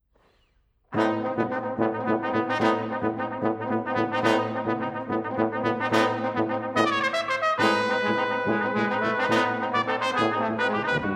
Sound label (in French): Passages pour quintette de cuivres, Vivace